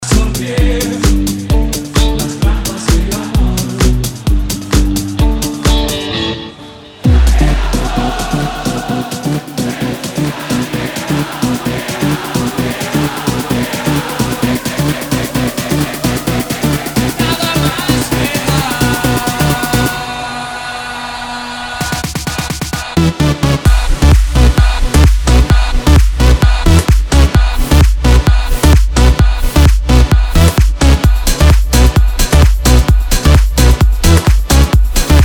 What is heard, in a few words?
Remix bpm 128